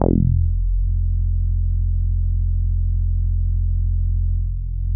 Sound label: Synths BASS